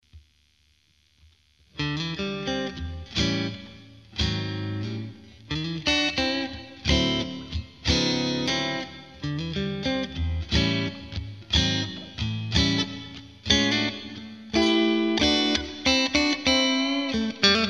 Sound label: Mitte Twin Clean, strat66; Middle 1